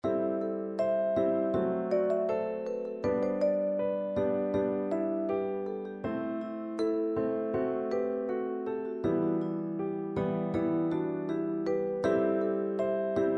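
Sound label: vibraphone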